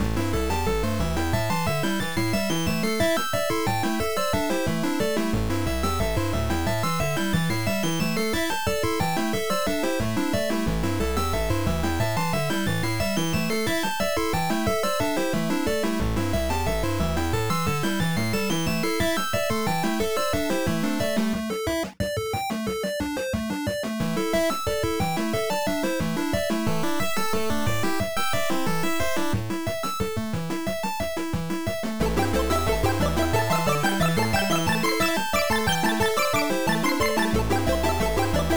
street punks fighting to save the princess, Fakebit / Chiptune